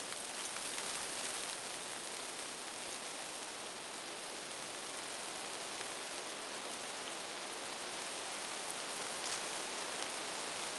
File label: rustle outdoor bush green foliage susurrate loop; sonniss gdc